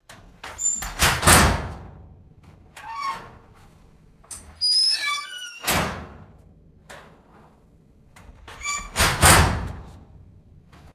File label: distant squeaky metal locker door; doors